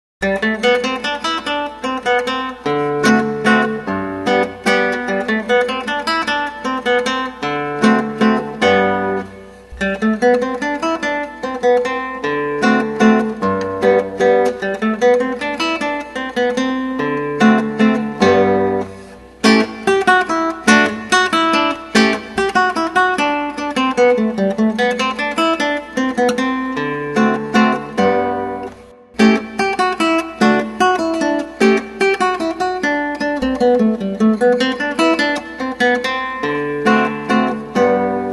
Waltz; Kuffner; Op 80

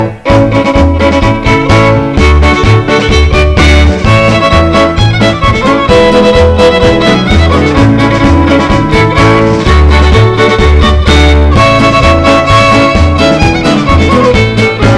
fid music

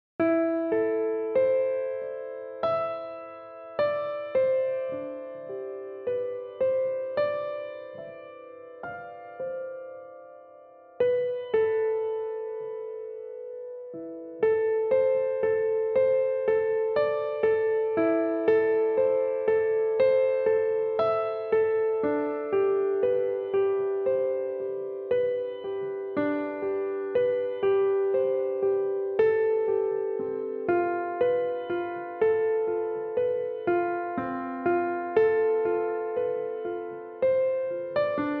piano

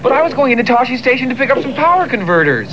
power con

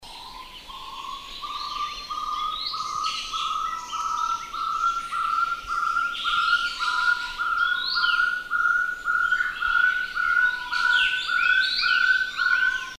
Taiwan Partridge, birdcall

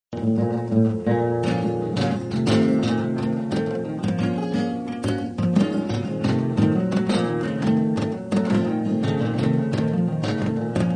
salsa style

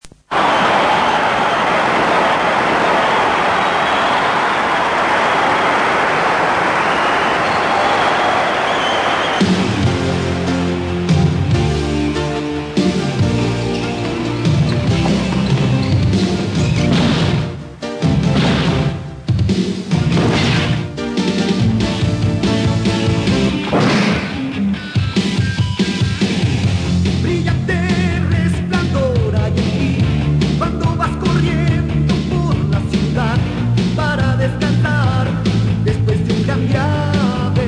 slam open

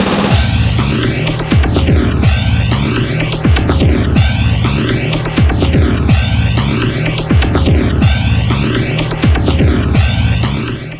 infern

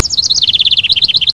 Morning Bird

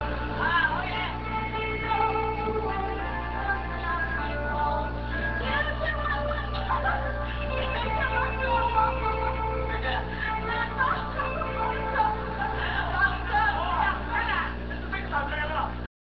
read sing

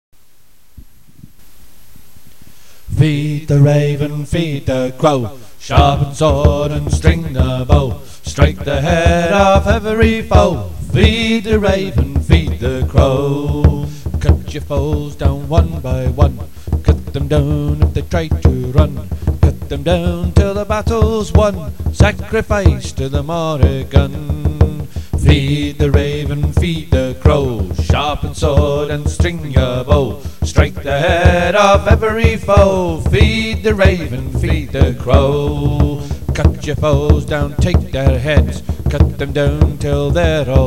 voice and drum

